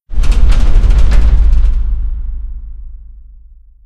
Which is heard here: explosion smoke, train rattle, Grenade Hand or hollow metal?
train rattle